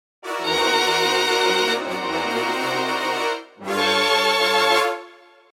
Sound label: tension